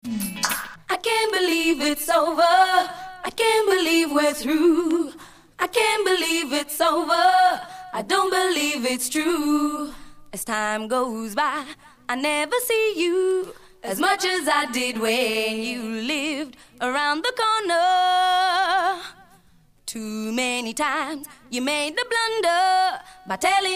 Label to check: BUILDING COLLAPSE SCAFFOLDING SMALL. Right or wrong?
Wrong